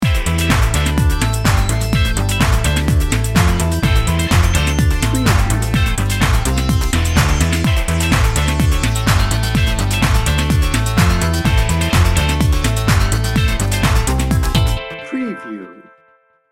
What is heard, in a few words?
corporate technology edm